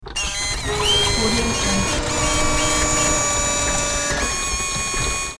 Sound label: servo mechanism